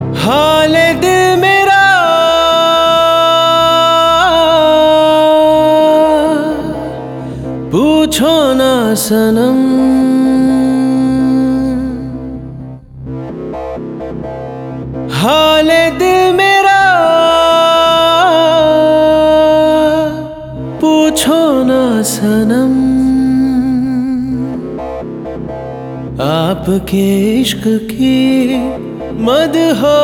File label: male